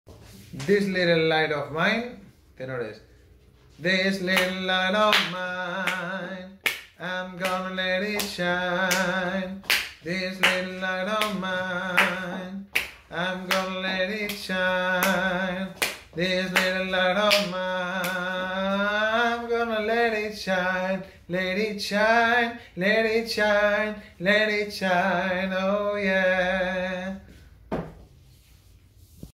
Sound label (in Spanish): tenores; This Little light of mine